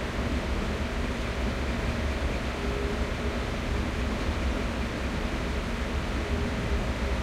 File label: maus engine int rpm, engines tanks; War Thunder